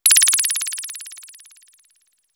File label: disintegration, notification sounds